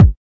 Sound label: kik